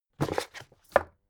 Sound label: book shelve very hard, wohnen